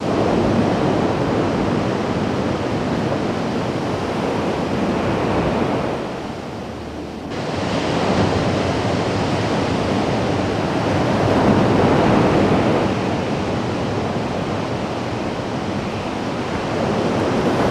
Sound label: Surf BG Gentle Lots Ai, waves & surf bg